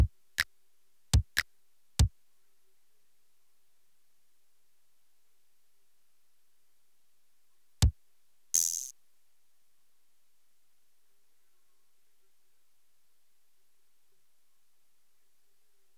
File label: pipe; skate